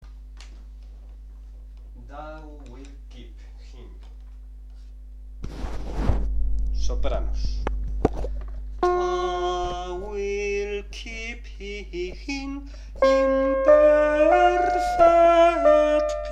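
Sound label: thou wilt keep soprano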